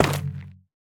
菌光体破坏声

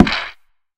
嘎枝之心击打声

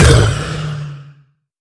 凋灵受伤声

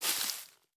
杜鹃树叶踩踏声

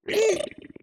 开心声; 炽足兽

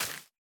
孢子花破坏声

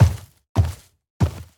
脚步声; 嗅探兽

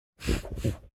嗅探兽嗅探声

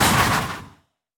受伤声; 旋风人

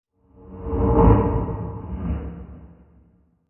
诡异森林氛围声; ambient

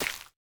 垂根破坏声

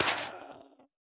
灵魂沙破坏声

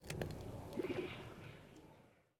试炼刷怪笼环境声; ambient

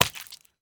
鱼受伤声

